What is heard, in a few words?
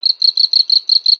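Pets Insect Large Happy; creatures; Lego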